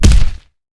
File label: Creature Footstep, Horror Effects